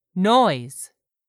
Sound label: noise